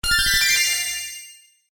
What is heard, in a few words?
UI Commit, User interface feedback sounds; Computers (Sound Effects:Electronics)